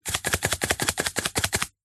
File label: industrial chemical powder in small plastic tub shake